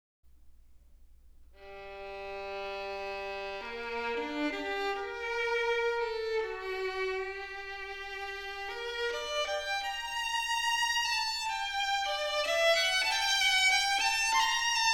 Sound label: Violin Recorded With Dirty Power; The Best of Chesky Classics; Jazz and Audiophile Test Disk, Vol 3